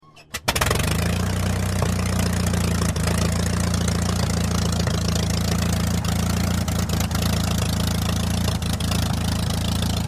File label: tigermoth